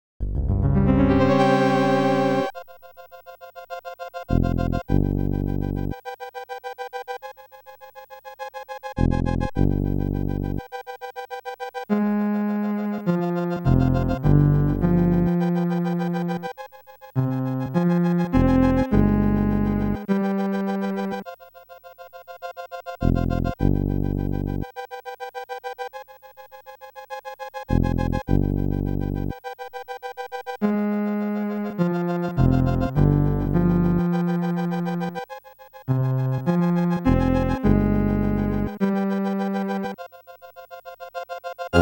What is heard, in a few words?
Castle BGM; Happy Mario 20th Super Mario Sound Collection